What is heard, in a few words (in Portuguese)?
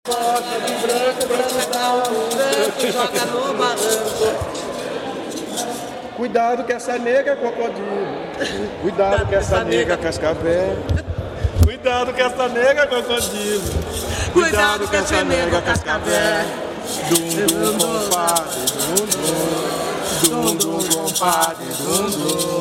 batuque de umbigada piracicaba cuidado